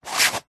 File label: tin very small circular lid on spin short; foley; zapsplat